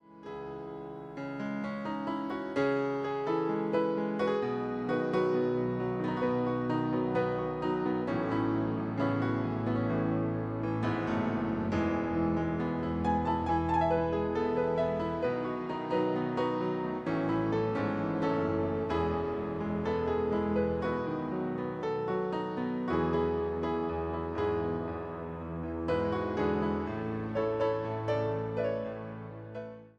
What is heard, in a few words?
Instrumental Cover